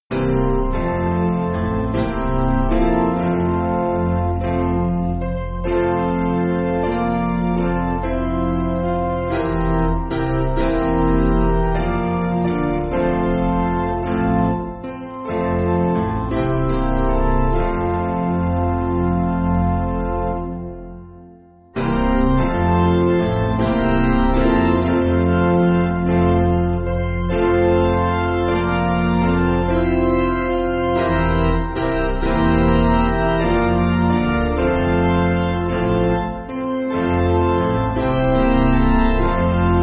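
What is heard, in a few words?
Piano, Music Backing Files for Churches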